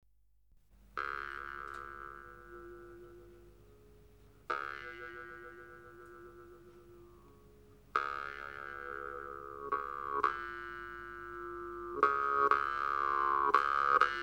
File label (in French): guimbarde